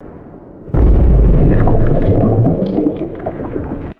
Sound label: small underwater explosion with gurgling bubbles